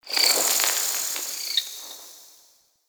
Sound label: Interior Cave Critter; Ambience; Warframe; Duviri